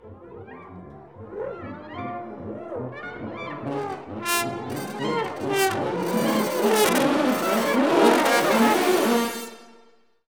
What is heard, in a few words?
BRS, LOW BRASS; Roland - LA Composer vol. 1